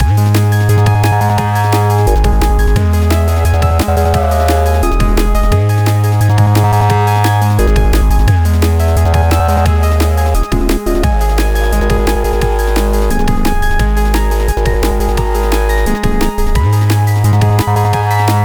Same Jup-8 V4 patch, Arturia Pigments for bass (+ Arturia EFX Fragments), and same D&B drums